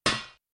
Ladder; Ethnic Cleansing

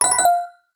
Gpe Rubis Catched; rayman jungle run android ios mobile windows gamerip 2012

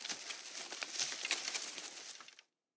Bushes; We Are the Dwarves

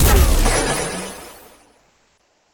Burst

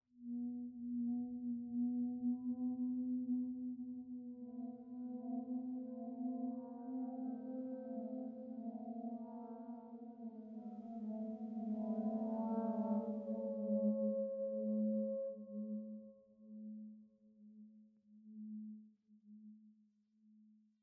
Scary Scratchy

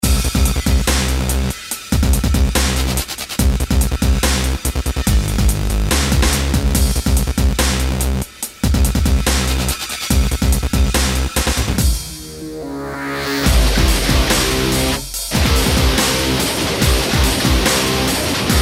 Zombies Music Loop; battle bears 1

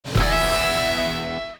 Player Level Up; FL337 Fleet

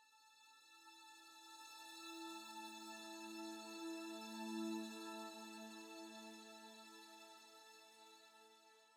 85bpm

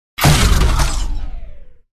Dwarves Mecha dwarf Overheat Start

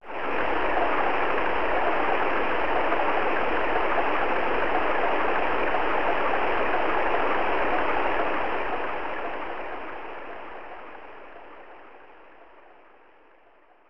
Rain; Sound Novel Tsukuru; SNES

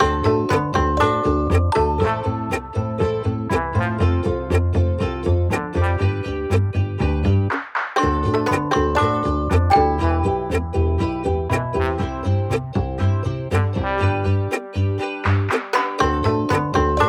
Carefree Gameplay Loop

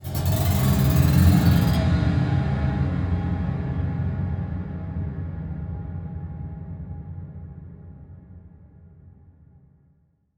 Piano Eerie Stinger

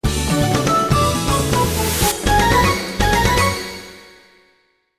Victory!; zatch bell mamodo battles; gc ps2

